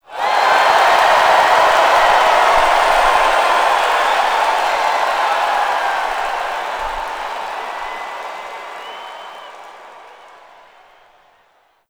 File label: Stadium Crowd Encore End; Warframe; Nineteen Ninety Nine; Quests